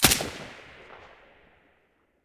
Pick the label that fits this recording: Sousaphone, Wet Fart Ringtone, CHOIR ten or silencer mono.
silencer mono